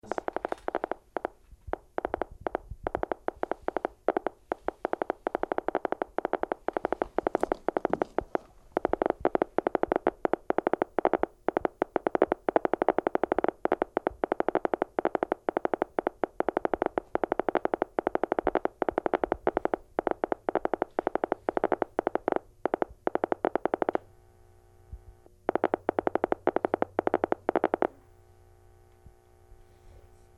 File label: digital gunfire; squid fist